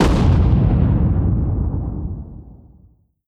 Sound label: heavy armour fire; Hearts of Iron IV Death or Dishonor